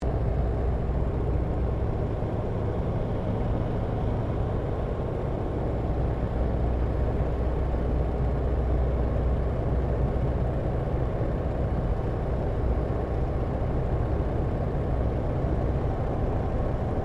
AIRCRAFT 1916 SOPWITH PUP REPLICA FLYING INTERIOR STEREO; Airplanes; Vehicles; Replica of a 1916 Sopwith Pup; Aircraft Collection